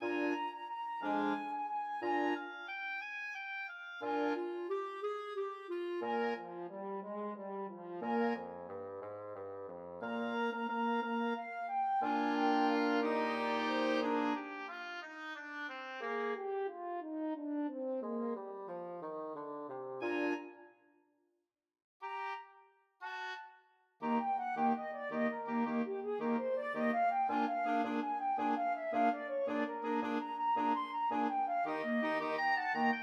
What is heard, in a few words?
Mexican Hat Dance quintet